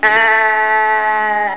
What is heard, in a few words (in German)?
elch